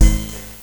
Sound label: Hit